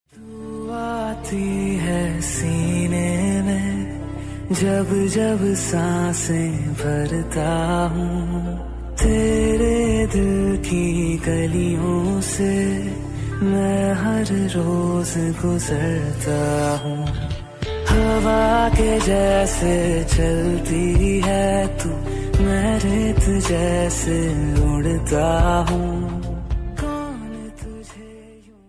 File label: cute romantic love song ringtone